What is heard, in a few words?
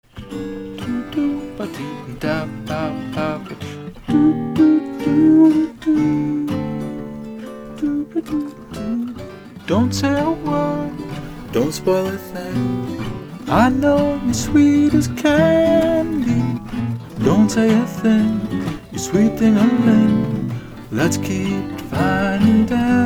Guitar Orchestra